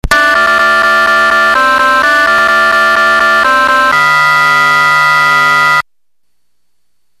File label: fanfale